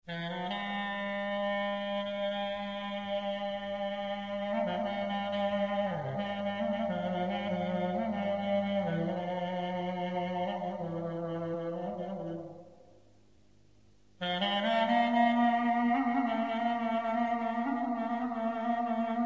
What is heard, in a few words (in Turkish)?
Klarnet Taksimi